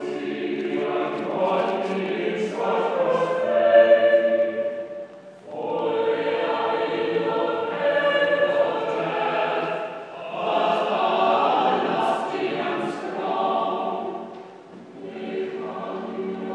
choir practice

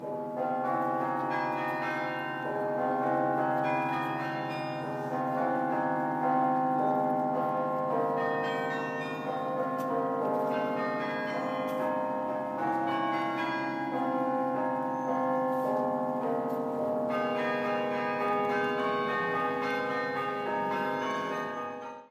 church bells

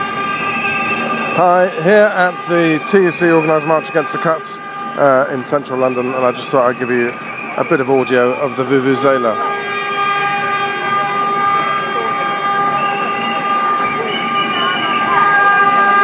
TUC march

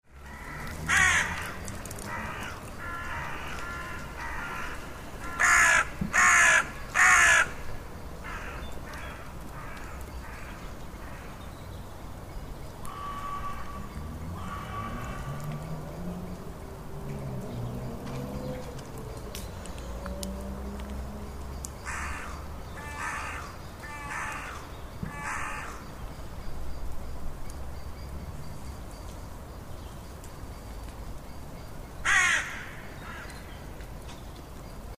crows in Ipswich cemetery

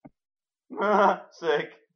techno

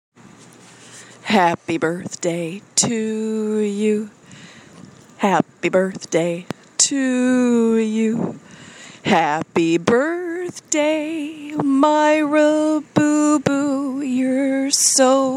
happy happy birthday

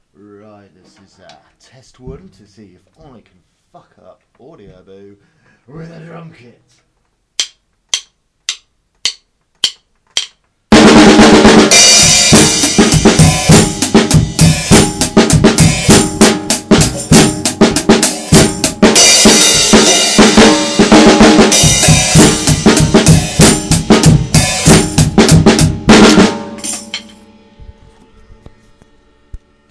drum kit test

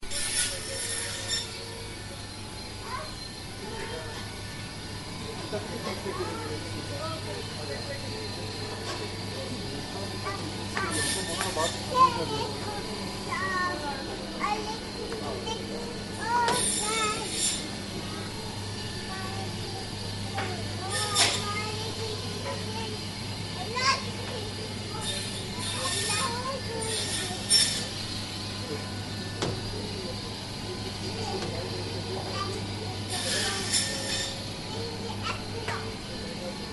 frozen fish being bandsawed, child singing

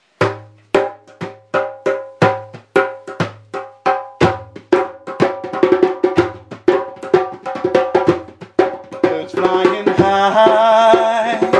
djembes and vocals

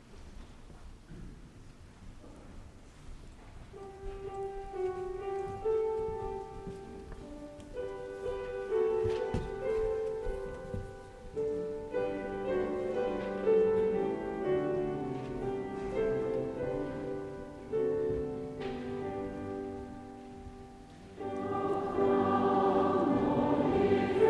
carol singing in copenhagen; copenhagen summit; church

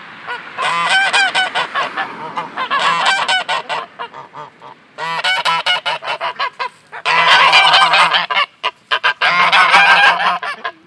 goose

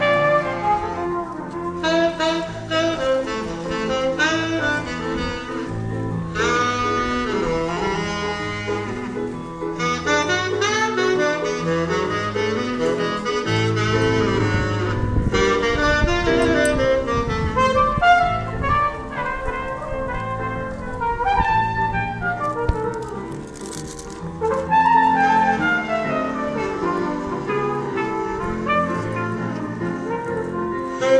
jazz; baden-baden

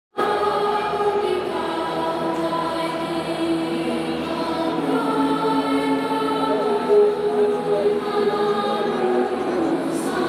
choir in action; the qantas wallabies; ausvnzl anzstadium

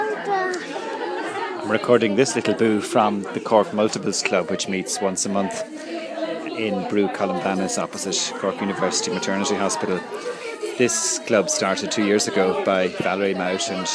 noise and joy; ork multiples club

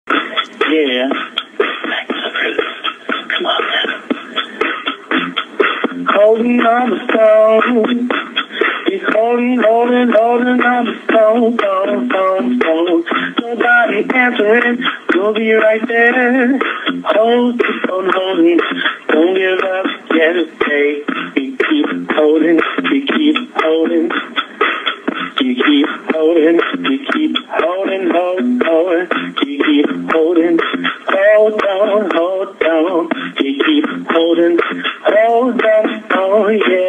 horrible diy hold music; use a professional music on hold provider

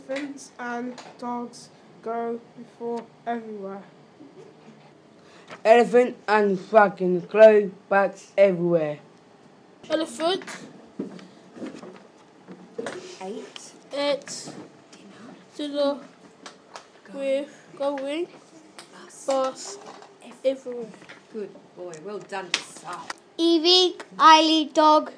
music strings

